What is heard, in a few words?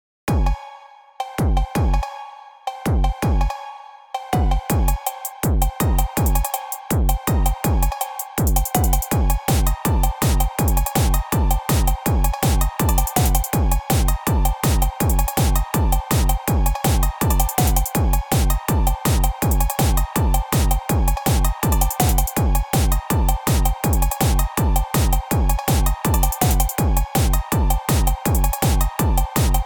phonk